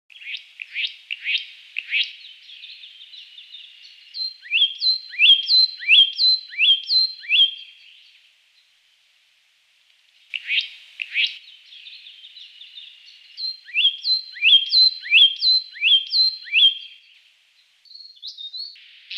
satin Flycatcher; flycatchers; canberra garden birds

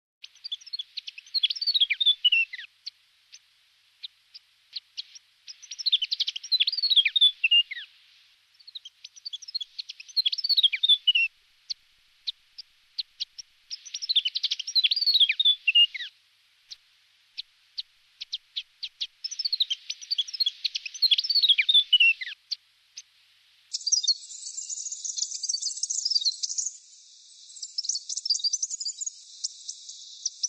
yellow Rumped Thornbill; australasian warblers; canberra garden birds

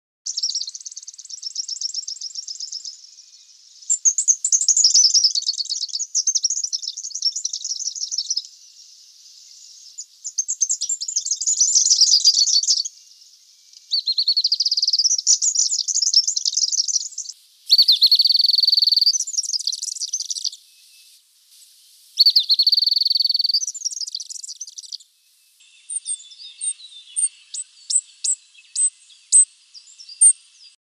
superb Fairy Wren, fairy wren; canberra garden birds

